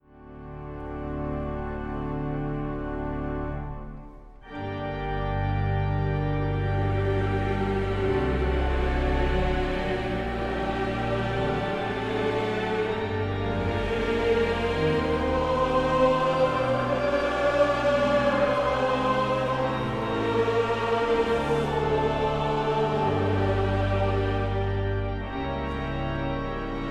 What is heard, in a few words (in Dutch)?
Kerstsamenzang Psalm 110